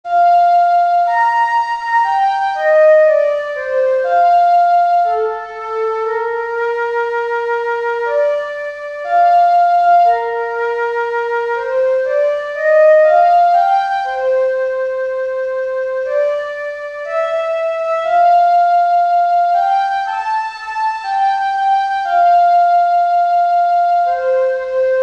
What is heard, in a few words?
flute